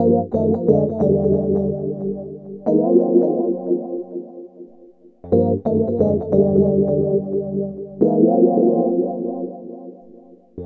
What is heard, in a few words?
Keyboard